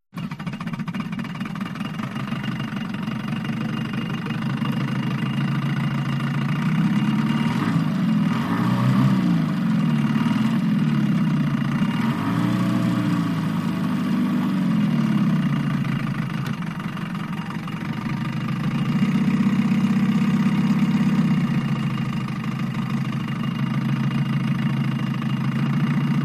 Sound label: Small Diesel Engine